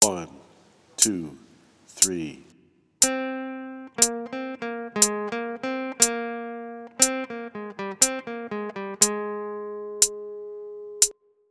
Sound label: Melodic Dictation